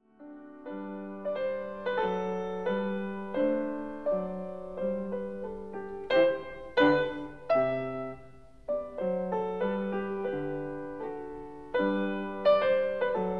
Piano Sonata No.9 in D major II. Andante con espressione